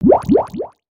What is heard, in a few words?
Drink; weapons